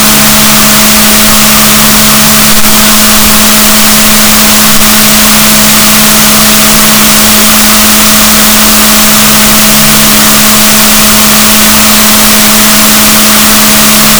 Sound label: rorquals negatif, gaussian noise; Balaenoptera Physalus; SNR 0dB